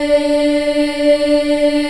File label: D3 child EH L, Choir; USB Soundscan vol.28